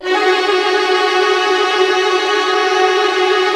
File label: WH TRLL F#4, Violin; Q Up Arts; Denny Jaeger Master Violin Library; PERFORMANCE